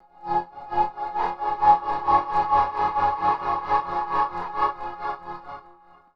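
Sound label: Pad Synth; Equipped Music; Loungin House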